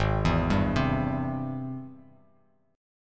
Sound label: Ab Augmented Major 7th Ab; arpeggiated; 4,7,5,5; JGuitar